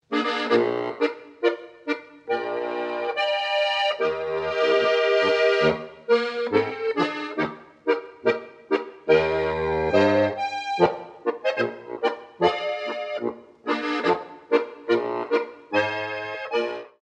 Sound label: strum; fuerstenstoa